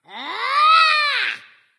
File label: battlecry little dragon; fafnir